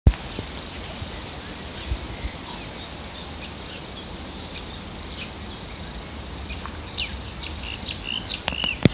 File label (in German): schlagschwirl